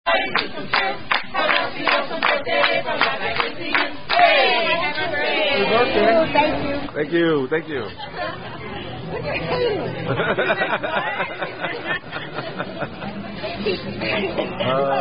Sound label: Birthday, Happy Happy Birthday; An 0utback Birthday